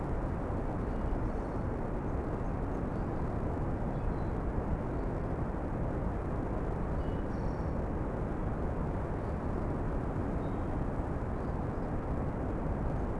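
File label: large atrium ambience; theed; Star Wars Obi-Wan; XBOX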